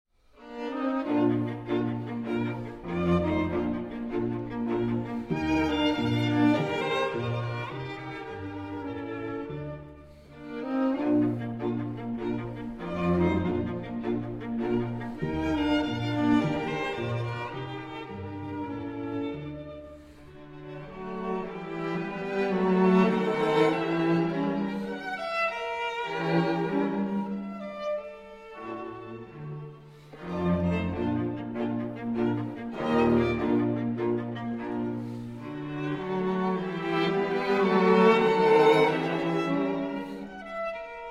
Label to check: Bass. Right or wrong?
Wrong